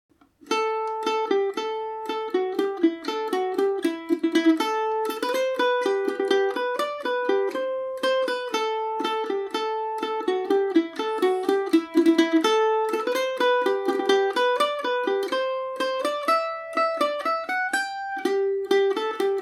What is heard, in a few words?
kerry jig ador, mandolin